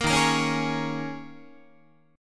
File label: D sharp 7th Suspended 4th, Guitar; D#7sus4; strummed